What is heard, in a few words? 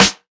focused snare drum sound g key